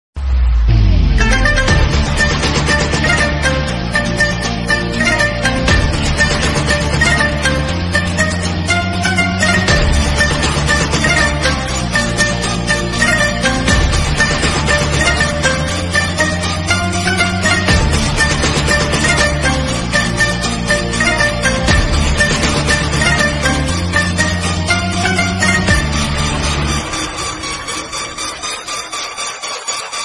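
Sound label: background music Ringtone; KGF 2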